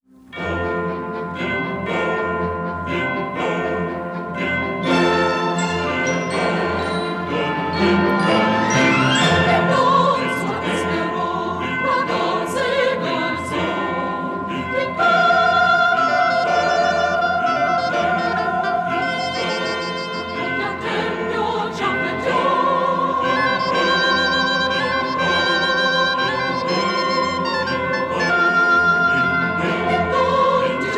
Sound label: Bell Chorus; Pagliacci